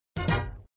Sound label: Sound Fanfare End of Ball, flipper